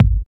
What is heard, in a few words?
natural kickdrum sample g sharp key, Royality free kickdrum sound tuned to the G# note